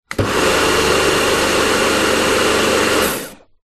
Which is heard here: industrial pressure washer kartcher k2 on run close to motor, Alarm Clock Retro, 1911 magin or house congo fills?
industrial pressure washer kartcher k2 on run close to motor